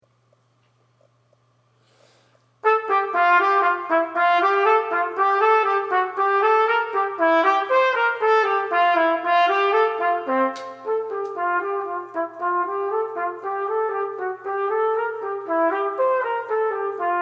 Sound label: Brass Music